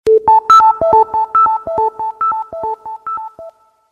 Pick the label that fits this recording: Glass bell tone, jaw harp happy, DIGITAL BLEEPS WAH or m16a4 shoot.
Glass bell tone